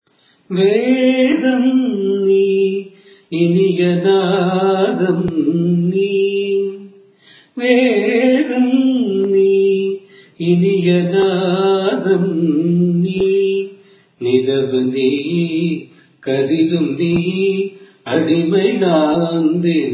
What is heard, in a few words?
raga gowlai cine; carnatic music